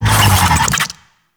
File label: Infested Big Ashen Vocal Pain; Warframe